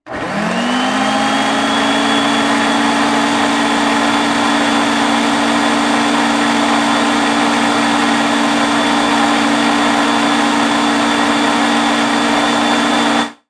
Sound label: Household Blender Turn On High Speed